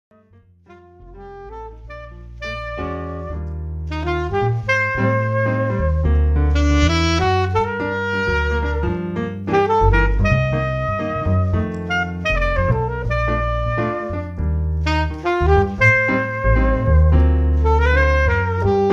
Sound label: Keyboard and Sax; keyboard sax jazz